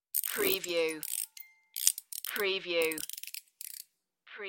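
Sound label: Wind Up Music Box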